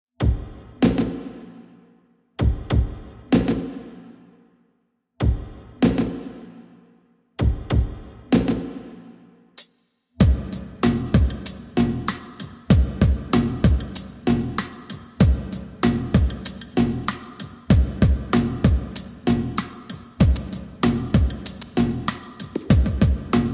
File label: Drums and Bass Mix